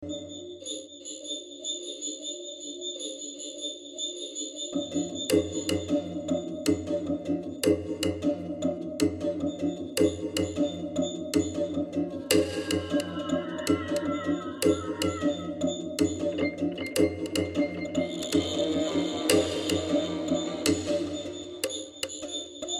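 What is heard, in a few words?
Admin After Switch Loop; F.E.A.R.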